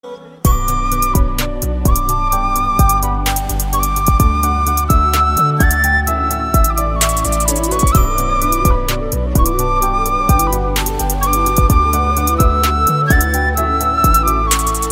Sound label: instrumental flute